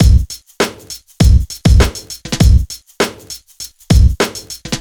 Boom Bap 100, Beats

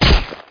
punch; MOTS

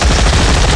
Mini Gun Fire

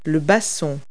bassoon; Multi-Lingual Talking Picture Dictionary

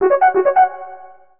Forum Invite; FireTalk

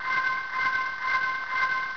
Scud Raise; shadez

